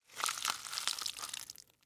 Tear Rip Watermelon, Texture, Tear, Rip, Watermelon, Flesh, Fruit; Ripping; BlastWave